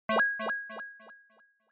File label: Short Accent Delay